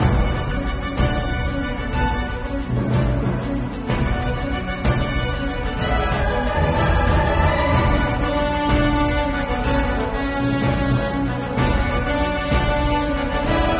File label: freespins background; Dragons Myth